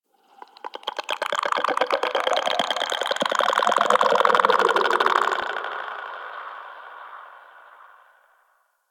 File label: Infested Devourer Vocal; Warframe